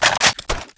xm8 slide back, weapons

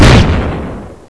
m79 fire, weapons

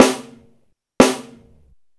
drums; jb snow

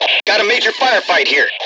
whoo

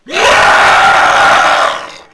zombie infekt

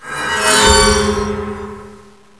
zombie pressure female

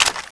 m249 clip out, weapons

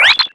enemy died

